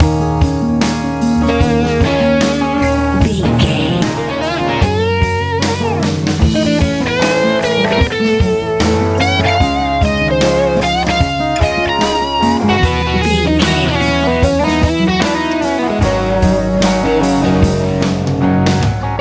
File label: easy rock pop